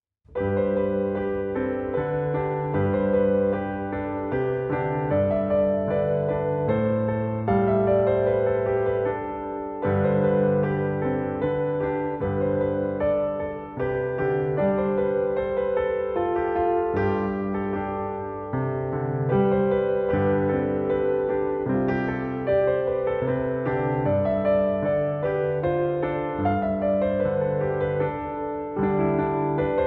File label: Piano; Harry Potter